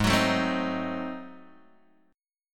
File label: Ab 7th Flat 9th down Guitar Open G